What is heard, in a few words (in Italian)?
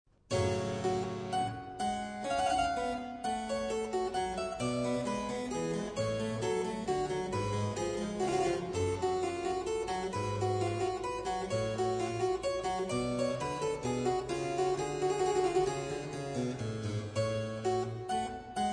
minuetto